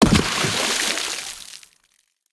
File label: boat; pirates